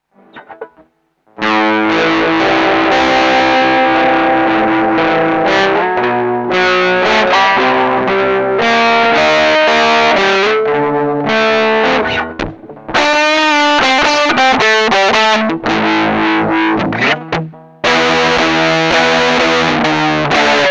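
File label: guitar wannabe drive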